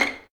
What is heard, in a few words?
vipiz C6, Violins pizzicato